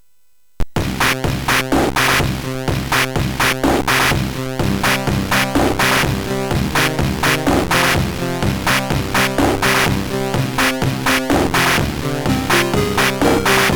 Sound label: Music, MOS6581R3